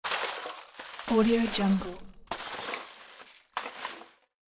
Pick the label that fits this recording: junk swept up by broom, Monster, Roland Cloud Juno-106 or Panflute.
junk swept up by broom